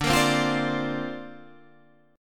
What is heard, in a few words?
D Minor 7th Sharp 5th, D, down, Guitar Half Step Down